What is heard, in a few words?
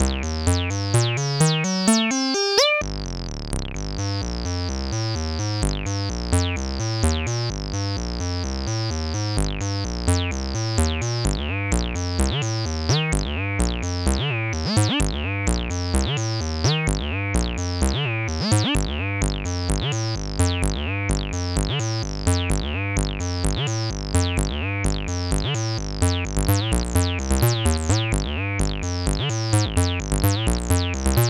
sqr